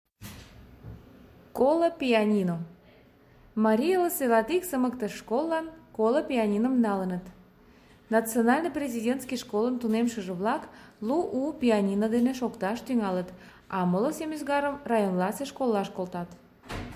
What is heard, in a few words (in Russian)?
Pianino